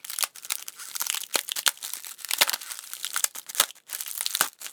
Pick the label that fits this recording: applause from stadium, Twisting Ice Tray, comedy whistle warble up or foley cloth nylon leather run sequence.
Twisting Ice Tray